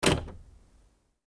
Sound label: cargo light switch off